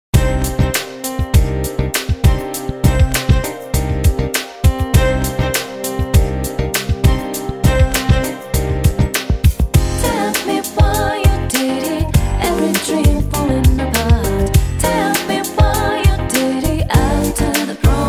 Heartbeat

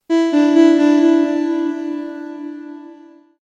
Alert; Electra

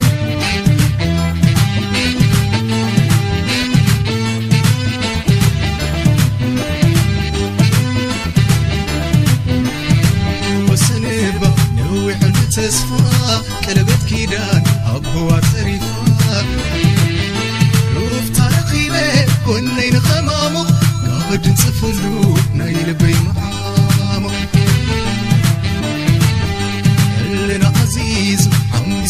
wedding song

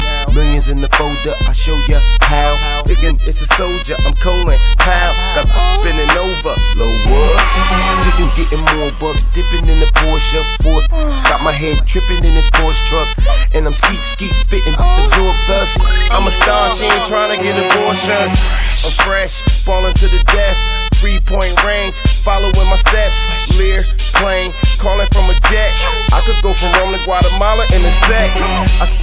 Crunk Beats

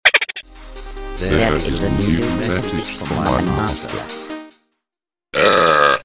Message; Mi Master

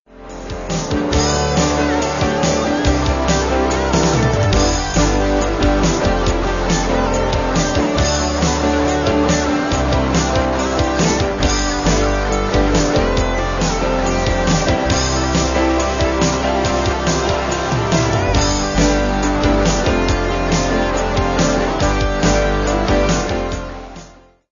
Guitar Riff

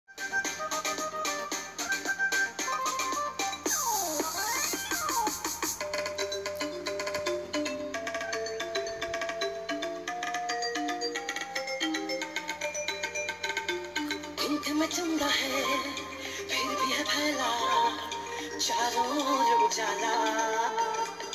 Indi Music

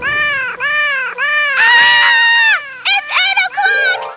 Clock

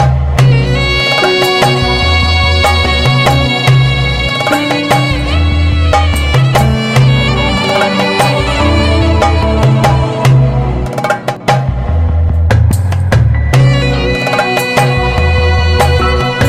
Violin

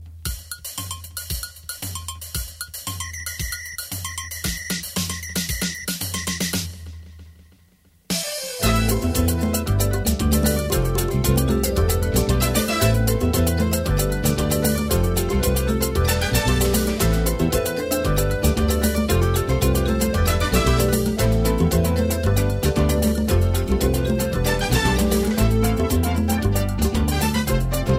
Sumba Dance

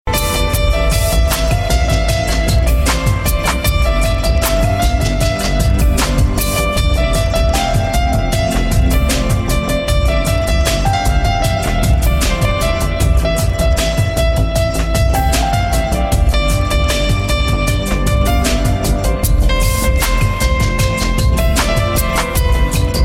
Piano

